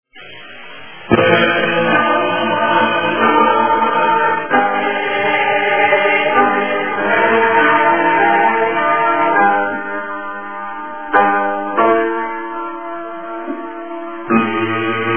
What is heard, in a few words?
Choir & Ensemble